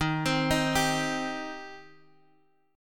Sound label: D sharp 6th, D sharp, down, Guitar, Drop D